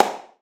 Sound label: Clap